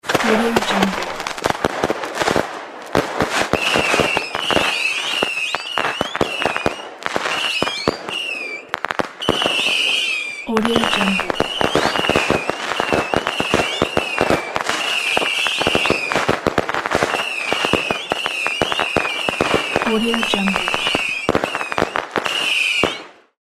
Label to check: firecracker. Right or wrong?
Right